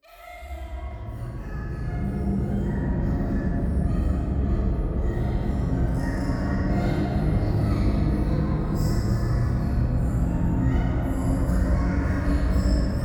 廃墟 喘ぎ 車の中

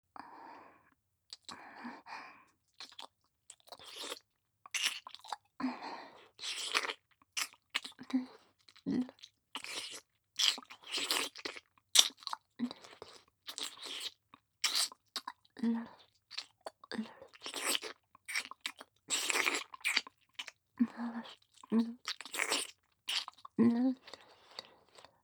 乳首 舐め 右

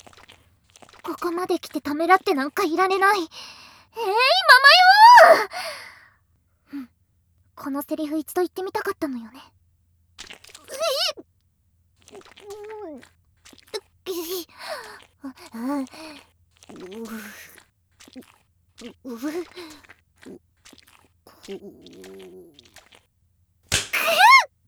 お着替え + 軽い喘ぎ

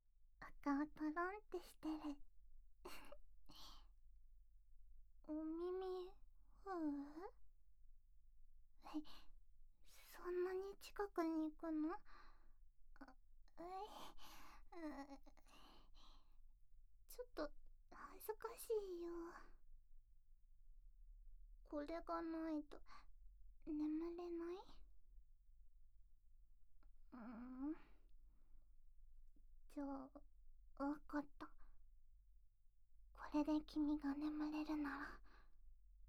耳ふー＆ぱっ R

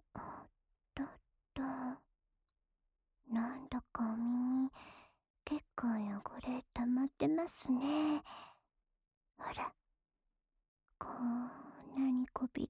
ゴリゴリ耳かき（右耳）, ASMR

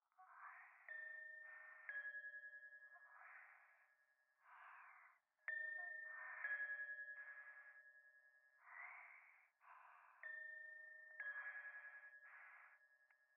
右耳 密着 吐息 神社

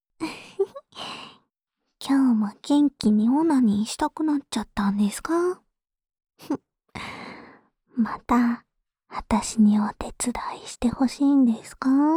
オナサポ用耳舐め 左

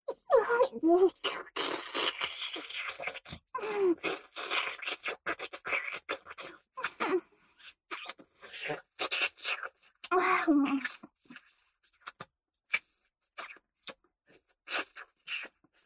フェラ吸い

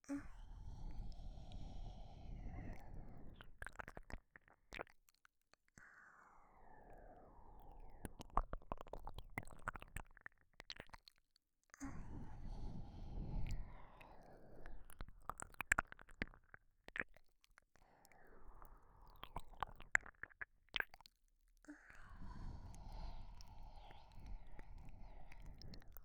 黒耳 右耳 吐息声漏れ; Free Speace ProII 黒耳

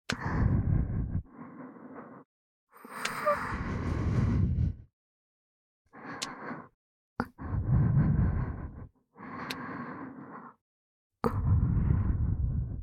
おまけの耳元囁き喘ぎエッチ, ASMR; 白耳; パンパン系効果音版

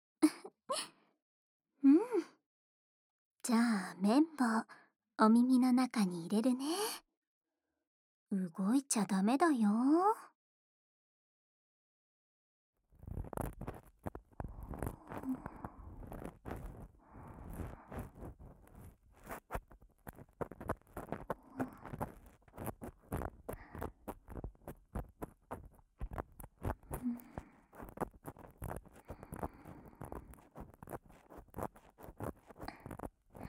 綿棒で右耳の耳掃除

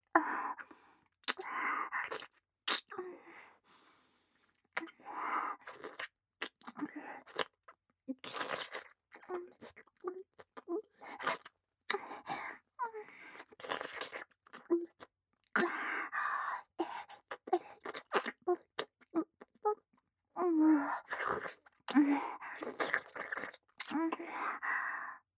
ディープキス

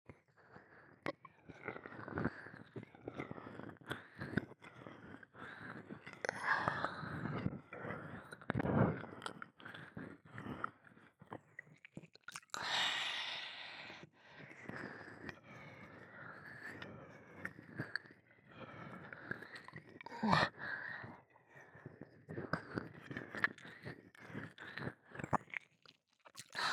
右耳舐め, ASMR

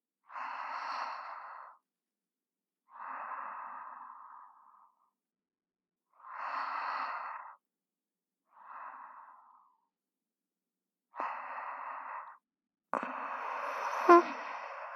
「あまとろ王女の寝息（右）」（ループ）, ASMR; SE有り

